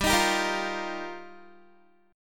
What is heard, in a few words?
G Minor 7th Sharp 5th, Guitar; Gm7#5; strummed; 15,18,17,15,17,x